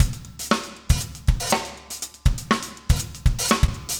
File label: funk, 120bpm, loops